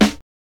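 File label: HSE SD, house snare drum; X-Static Goldmine 1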